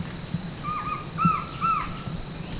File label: collared trogon